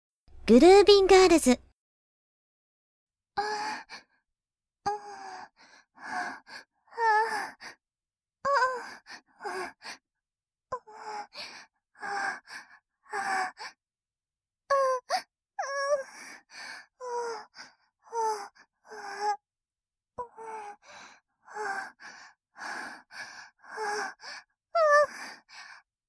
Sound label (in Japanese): あえぎ声